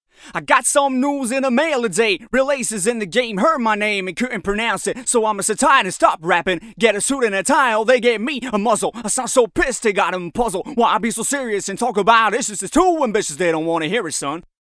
Rap Vocal Dry

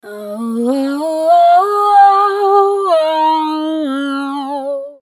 flanger

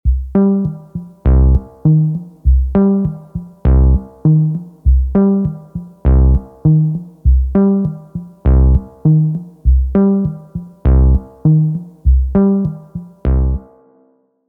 Synth Sources RE201

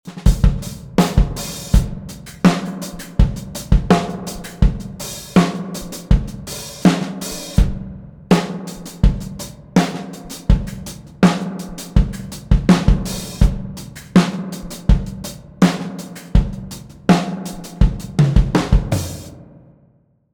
Drum Buss; SR202

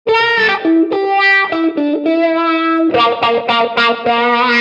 wah guitar

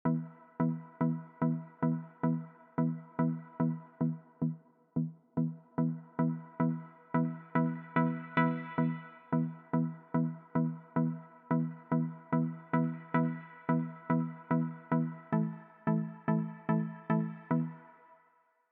Synth Pluck Dry

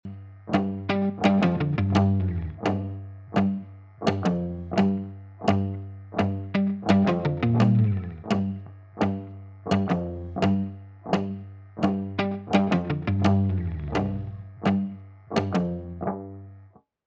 Gtr Buss Sources Dry

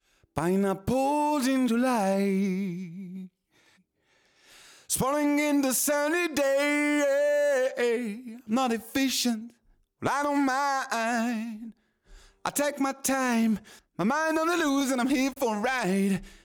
vocals wet; smasher

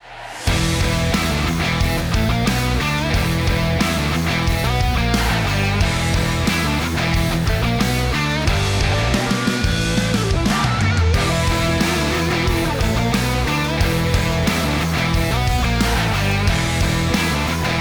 Rock Mix Dry

